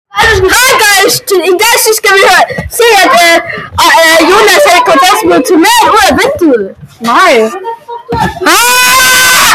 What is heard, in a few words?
nej rahhhh